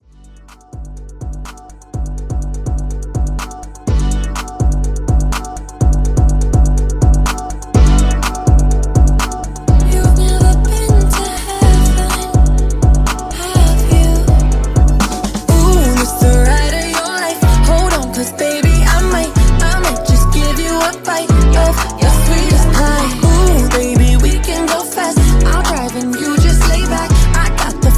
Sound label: Mixshow, 124; DJ Intro Outro Edit